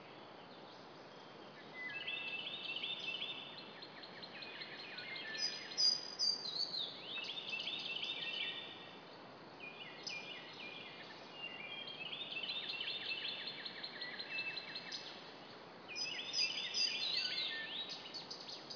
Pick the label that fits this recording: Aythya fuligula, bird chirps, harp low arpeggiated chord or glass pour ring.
bird chirps